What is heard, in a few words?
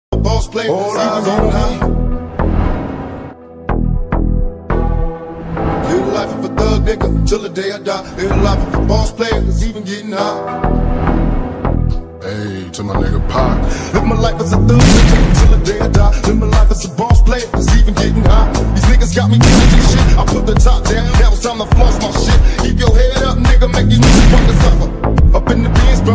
song; free fire montage edit